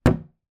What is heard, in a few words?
household window wooden frame old close